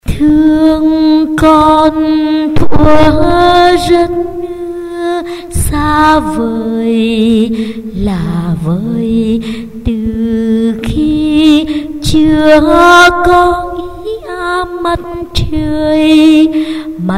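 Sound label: solo tenor soprano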